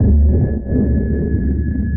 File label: sonar Ping Suit Medium Shuttle Directional; Barotrauma; Real Sonar